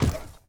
robot step; teardown ps5 windows xbox series xs gamerip 2022

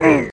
Dwarf Hit; The Battle for Wesnoth

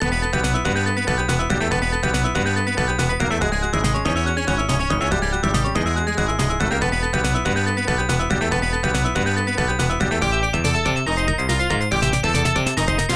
Unused BGM

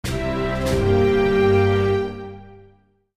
diplomatic very good

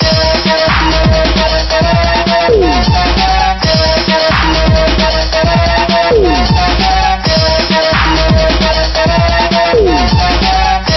Ditty; Fox Sports NBA Basketball 2000; PS1

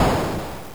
Open; Battlezone

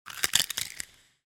Crack!; Cookie Jam

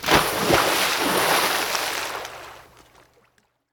water splash

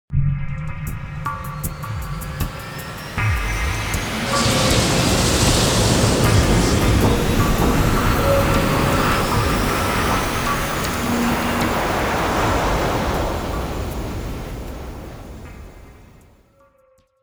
Sounds Cinematics, Liftoff

